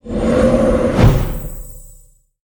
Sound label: Shaman Totemic Call Cast